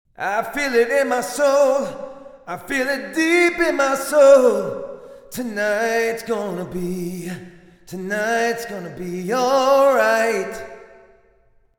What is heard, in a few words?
Reverb - Vocals - Drum Room; CRTIV Reverb